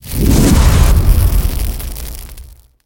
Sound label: Scatter Win Add